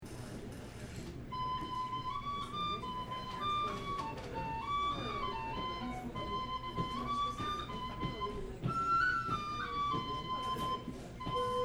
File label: Finnish Polka